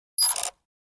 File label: Button Squeaky Metal